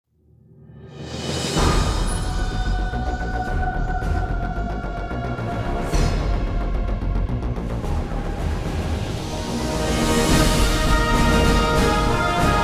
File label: Result Screen; Metroid Other M